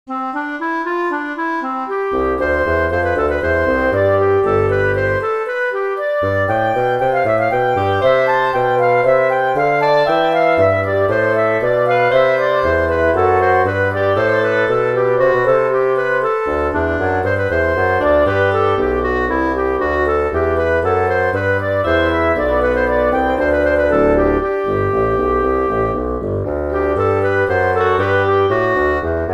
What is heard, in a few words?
Two Part Invention; Bach